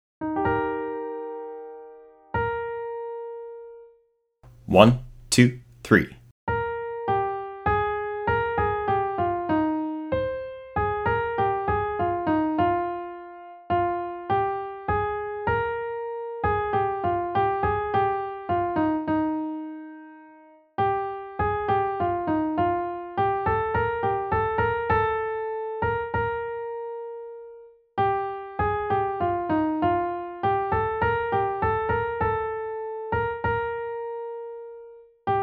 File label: Alto 1 key of Eb; Alto I scale; Alma del core; all-state